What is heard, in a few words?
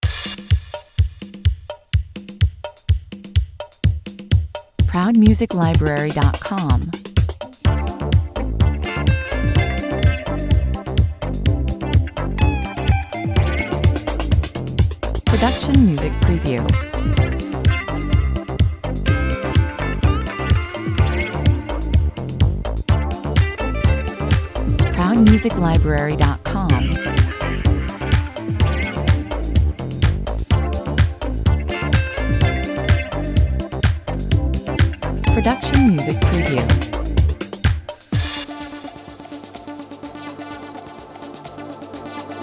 An upbeat but relatively soft pop/electronica track with the addition of some bluesy guitar; An upbeat but relatively soft pop/electronica track with the addition of some bluesy guitar licks for a more human touch. Positive and grooving